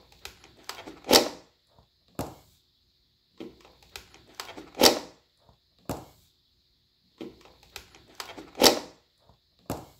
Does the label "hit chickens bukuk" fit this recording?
No